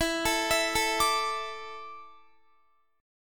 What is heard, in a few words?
E 7th Suspended 4th, Guitar; E7sus4; arpeggiated